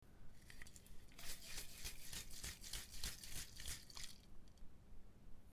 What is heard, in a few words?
shaking can